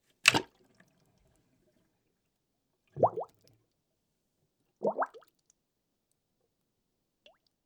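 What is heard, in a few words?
Bubbling Clogged Sink Drain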